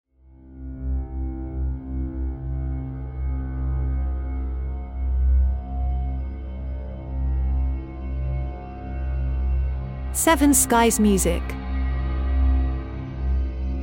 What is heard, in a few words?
Dark Ambience